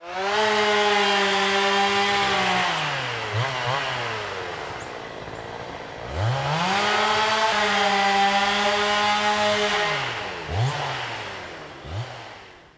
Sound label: out saw; Mafie 1